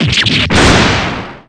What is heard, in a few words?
break; roly